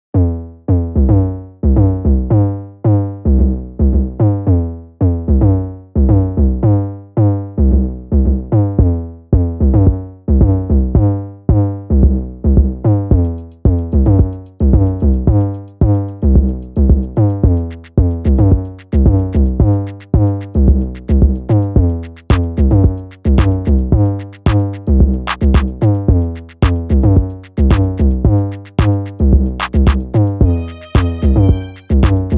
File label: instrumental